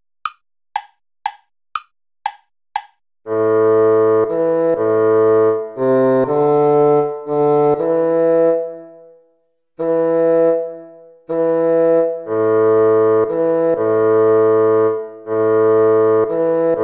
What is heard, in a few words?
baroque minuet minor play along